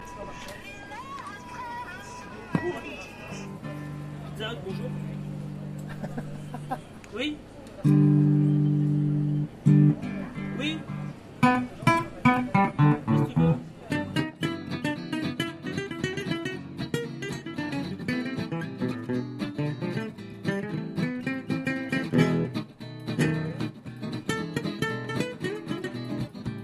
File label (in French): Jazz Manouch; Marche de Trix